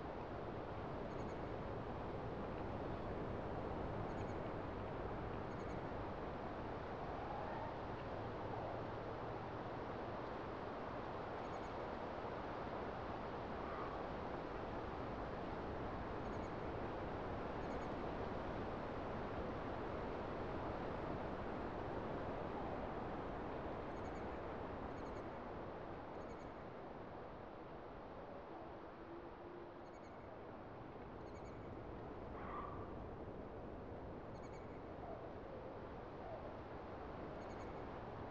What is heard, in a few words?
int half open; Titanfall 2